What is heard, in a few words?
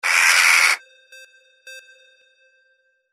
CROW